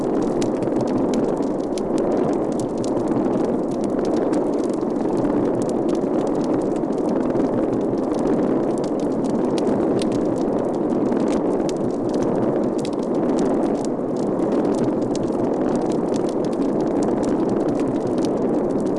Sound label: weakfish chorus, fish sounds